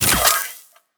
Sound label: Weapon Reload (Flamethrower)